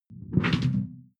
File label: sci-fi alien bloom short; swooshes-swishes